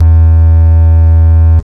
TRAX BASS, Hard & Loud Techno; USB Soundscan vol.01; AKAI

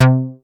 C3, BASS; Q Up Arts; Dream Experience; BASS BANK 13

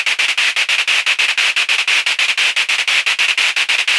Gated Noise 120BPM; musicradar

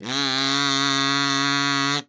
KAZOP D3, KAZOO; Xsample VOL-9

